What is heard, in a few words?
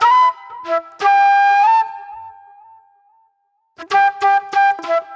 Sharp Delay Flute